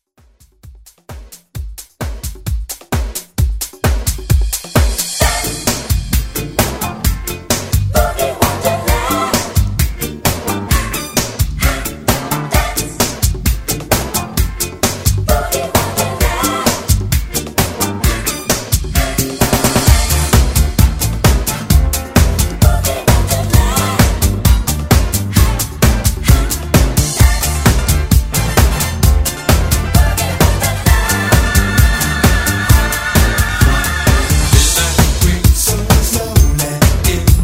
70s Disco Funk Redrum

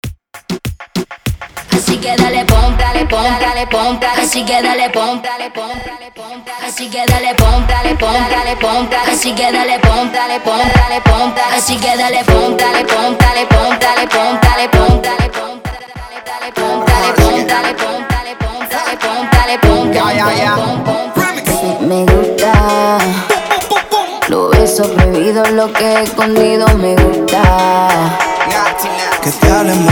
96Bpm; DJDOLLS

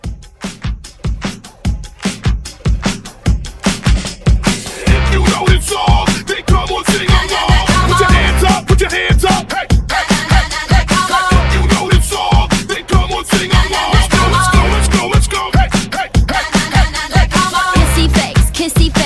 Hype 149

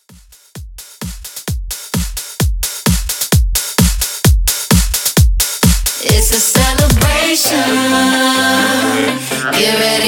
ReDrum 130Bpm

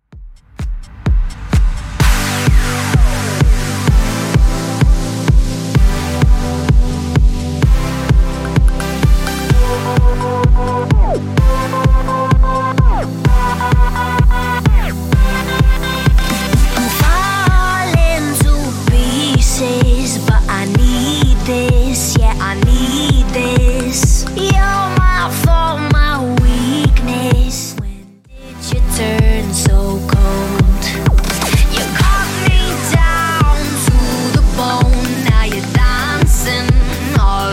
2ks Redrum 128bpm

